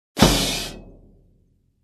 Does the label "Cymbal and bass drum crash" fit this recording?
Yes